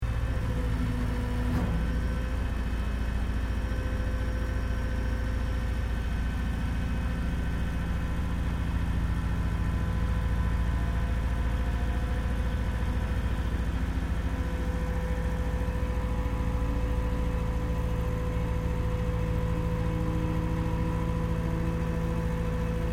Boat Ferry Car Operation Loop; Wisemans Ferry Nsw; Boats (Sound Effects:Vehicles)

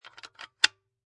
Locked Latch Unlocking; Door Slam Sound Effects; Lynne Music

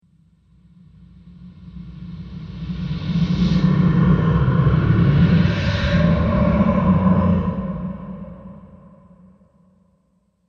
Deep Space Demon, Alien Sound Effects

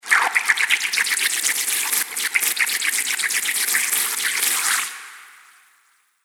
Fantasy Long Water Spray; Spraying Sound Effects

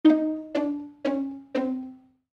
String Plucks, String Plucks Descend; Cartoon; Musical

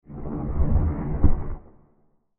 Creepy Creature Monster Horror Transition; Scary Soundscapes; Crafted Signals

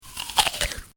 Eating Bite Munch Crunch Person Bites Into Apple; human; Eating Sound Effects; Lynne Music

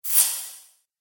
Warlock Magic, magic; Magic Sound Effects; Role Playing Game; Andrew Fleming

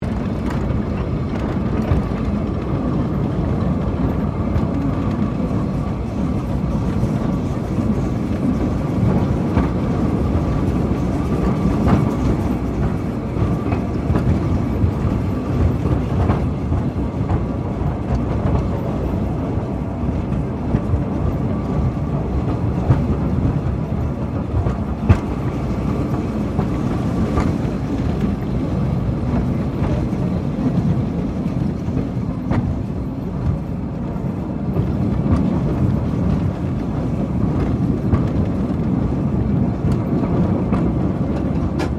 Tram Cable 1800S Operating Fast Loop; Portland; Portland Cable Tramcar; Streetcars; Vehicles

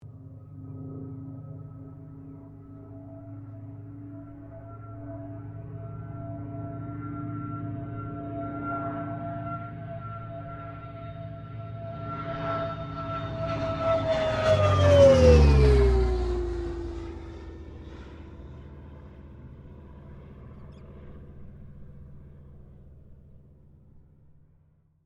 AIRCRAFT CA-13 BOOMERANG PASS STEREO

